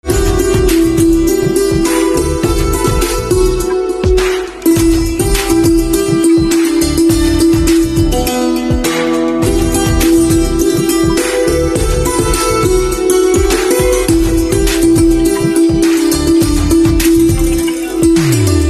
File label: Santoor